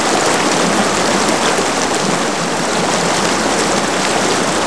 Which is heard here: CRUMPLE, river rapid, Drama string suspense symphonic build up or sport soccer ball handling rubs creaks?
river rapid